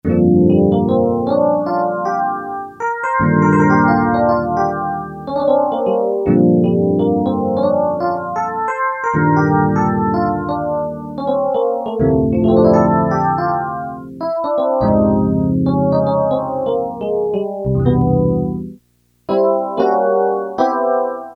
Elec Piano, Casio CT-370; Tones

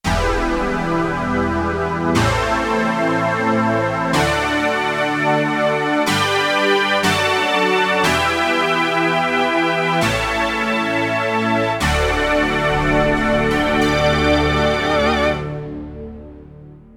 Stack, Yamaha TX16W Rock Performance; Rock Performance 2

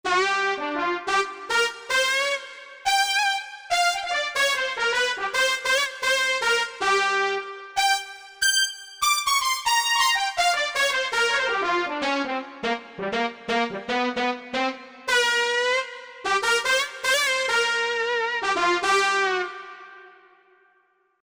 Magic Harp, Korg MSC-9S Organ

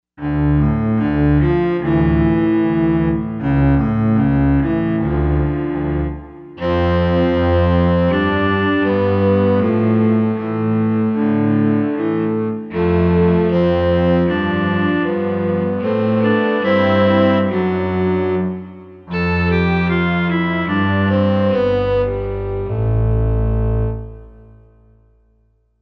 Cellos, Korg M1; Factory Patches

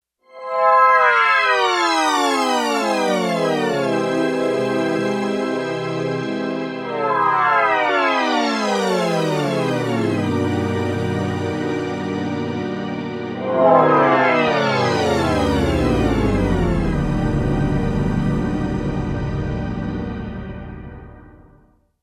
Spacedown, synth

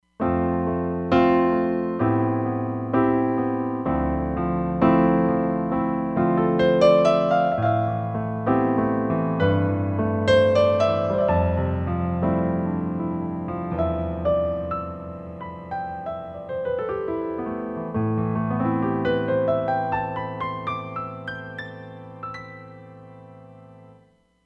Warm Piano, Roland Fantom XR

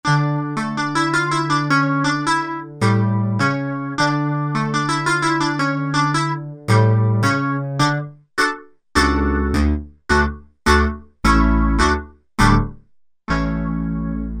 2 voices; Korg MSC-12S Synth 3

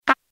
PCM Lips, Roland D-50; Waveform demos

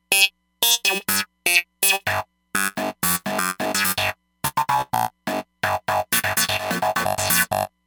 Lo Fi Sequence, Roland Fantom XR

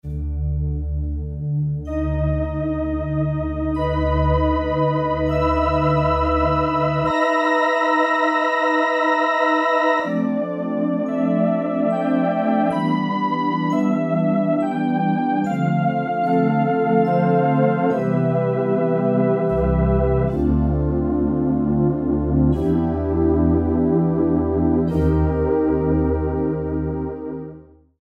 DIGIVOICES, Casio VZ-10M; Internal factory combi

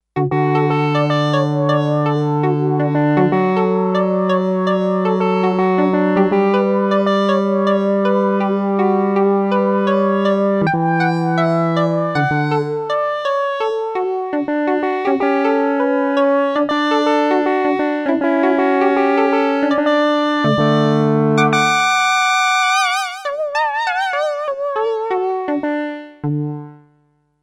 Inverted pluck, Sequential Circuits Six-Trak; Factory Programs

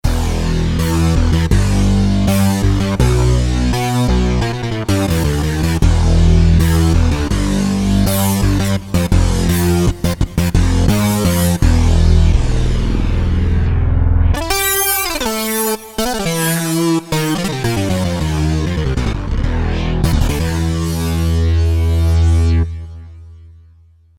Serious Syncin', Roland JD-990; Vintage Board

